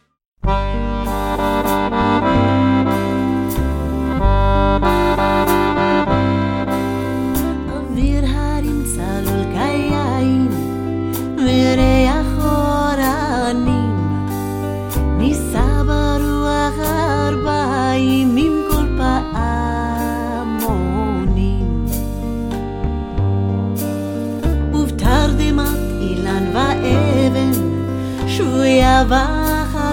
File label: Jewish Ambiance